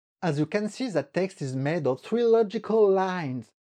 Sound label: DIFFERENT TONE